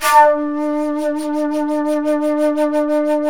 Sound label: SHAKUHACHI; World Combo